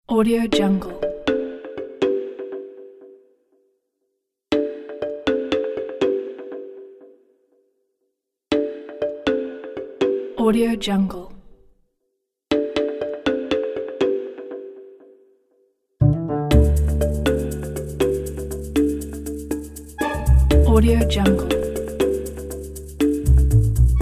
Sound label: comedy quirky animals adventure idea